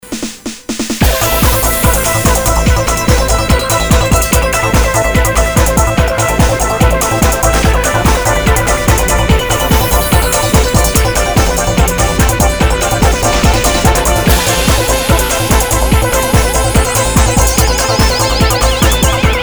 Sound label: indoor, progressive trance